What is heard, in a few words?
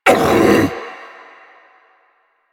Stalker Pain Grunt; Warframe; Quests; Jade Shadows